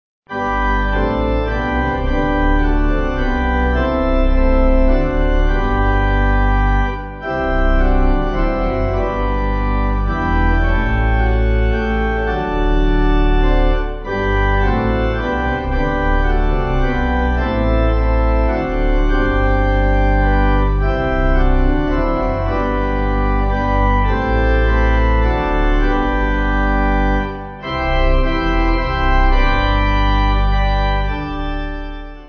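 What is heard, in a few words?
pipe organ hymn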